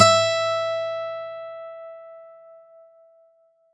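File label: Plucked steel string E5; Acoustic and Electric; Polyend; Simulacrum Sounds; 1 Shots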